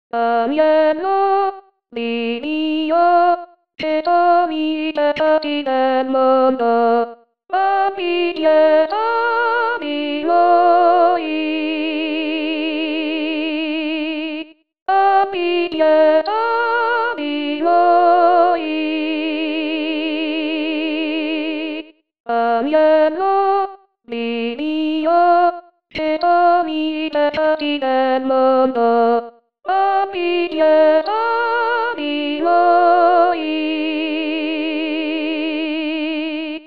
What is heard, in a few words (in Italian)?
Agnello di Dio Soprani; Auricchio; anno liturgico